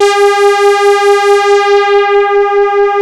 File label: G5, SYNTHS; OBMX; SQUEEG